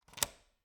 Cabin, front door, deadbolt, lock, latch, thick, close, stuck, hyper; Eiravaein Works; Vaeyan IV